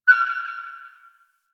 Beacon Active Blip; Rail Jack Scenario; Warframe

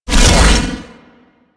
Shield Launch; Enemies; Weapons; Warframe; Corpus; Arachnoid Camper

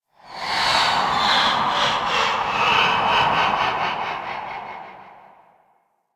Void Connector Shriek One Off, Ambience; Warframe; Citrines Last Wish

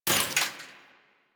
Arch Rocket Crossbow 2D Mech, Weapons; Warframe; Archwing

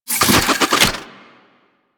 Fist Spin; Enemies; DE; Warframe; Tyl Regor

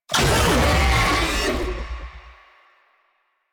Infested Oni Vocal Pain; Warframe

